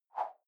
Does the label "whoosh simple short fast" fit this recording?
Yes